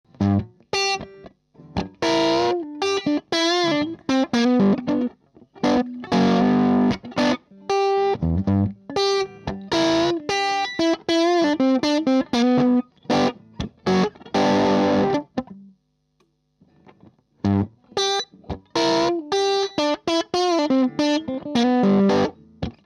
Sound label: bluesy crunch